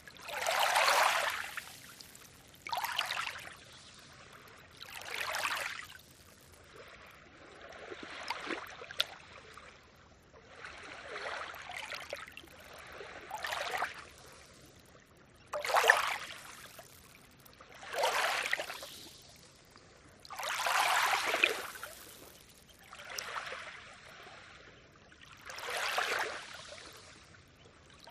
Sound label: Seashore